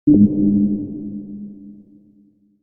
Space Style Ambient Noise, Eerie

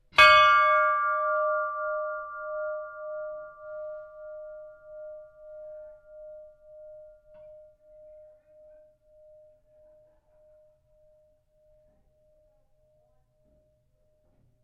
SHIPS BELL IRON RING; Flinders; Various ships bells; Boats; Vehicles

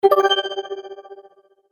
Magic Purchase Vibrate Ding; Cartoon Sound Design Sound Effects

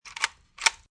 AK47 Load; War; VRSonic

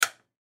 Light Switch On; Household; Blastwave

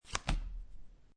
Playing Cards Deal Single Card Turn Or River

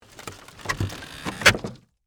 Wood Stress Bend, Nail, Squeak; Foley; Wood Sound Effects; Wood (Sound Effects:Materials)

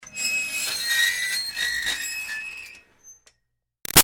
Screech Metal Iron Board Slow Painful; Ironing Board; Slow Studder; Materials; BigRoomSound

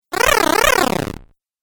Cool Fx Video Game; Video Game Sound Effects

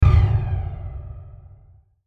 Hit Low Flange; Production Element Imaging Element Accent Transition; Bass Buttons; Blastwave

